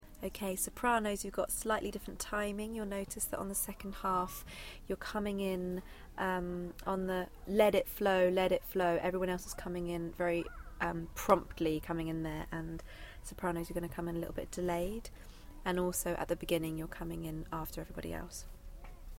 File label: (soprano intro); Choir